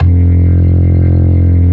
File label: ORG VK, B-3 ORGANS Vinta Keys B3